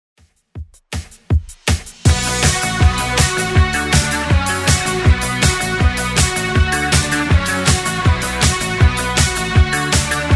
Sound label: 80s Redrum 160bpm